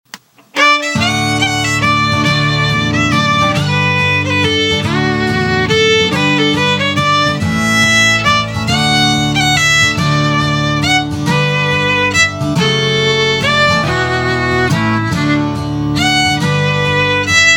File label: fiddle